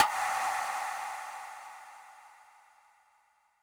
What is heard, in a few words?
Perc Smack Verb